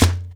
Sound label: PATUM, Percussion; InVision Interactive; Percussion Slam